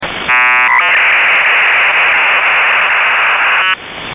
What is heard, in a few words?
Chinese 8FSK PSK